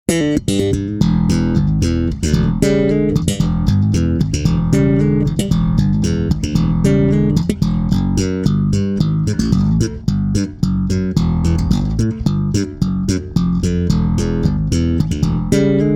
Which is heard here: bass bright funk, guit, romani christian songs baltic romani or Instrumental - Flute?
bass bright funk